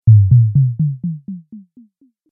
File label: Sub Drop Rising Delay, Low Impact Sound Effects